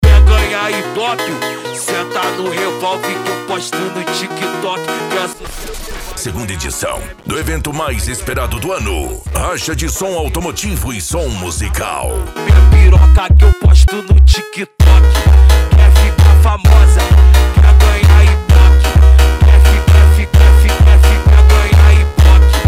racha de som automotivo e som musical

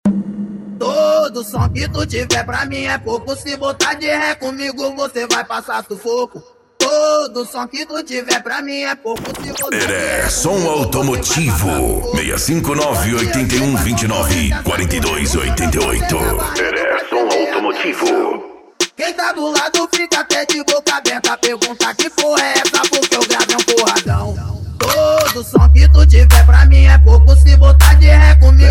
som automotivo

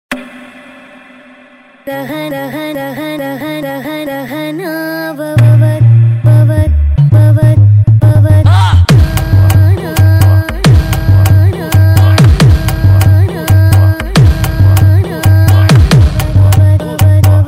funk bass o poder do grave

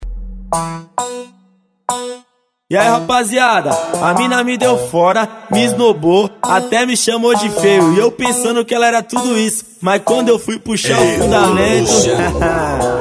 especial funk

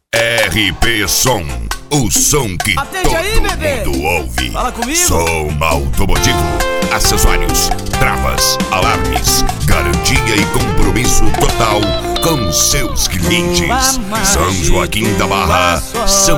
sertanejo universitario

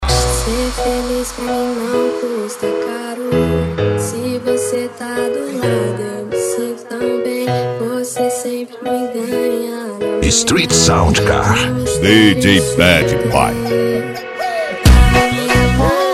sound car funk; street

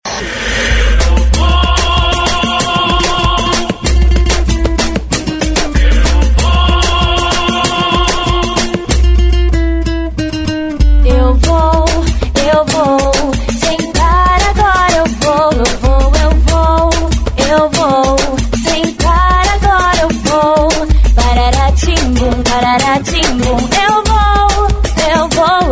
especial funk e electro house

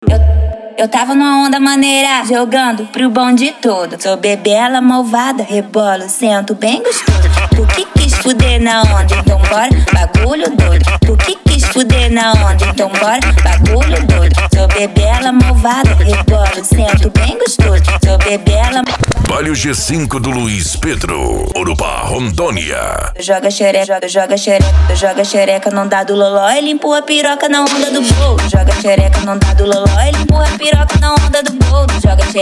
funk mega bass e forro; urupa ro

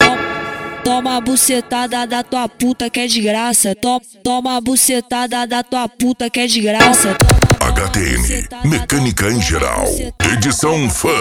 mecanica em geral edicao funk

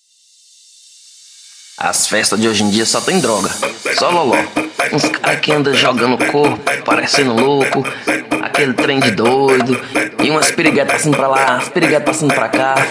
eletrofunk

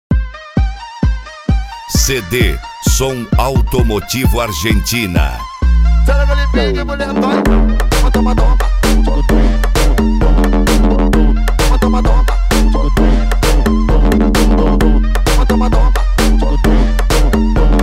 som automotivo

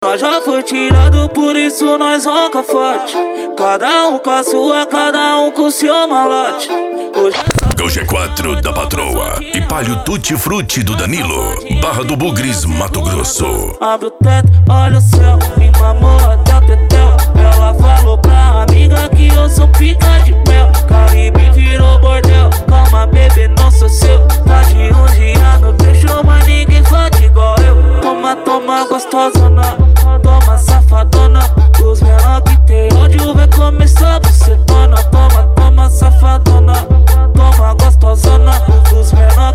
funk mega bass e flash back

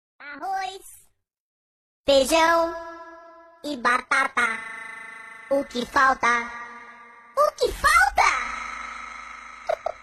mega funk

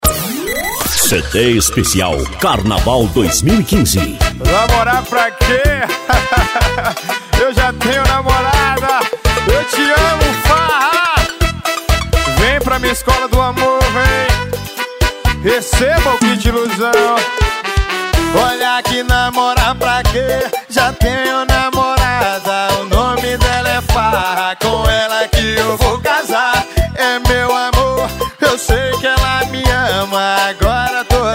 pagodao funk; total som; carnaval 2015

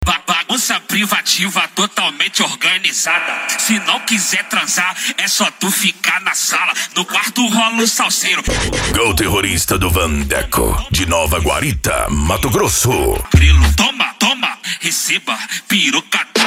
dance e funk mega bass

